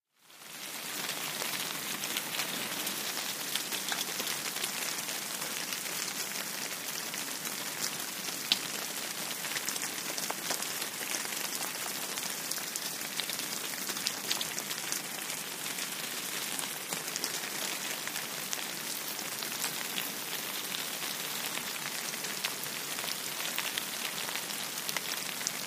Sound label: Rain Fall On Foliage